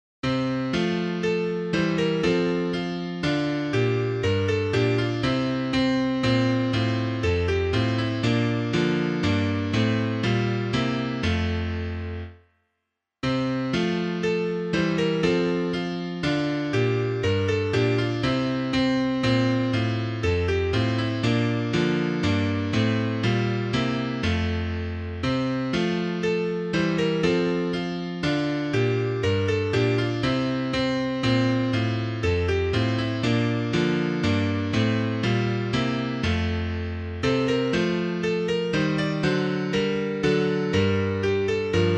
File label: piano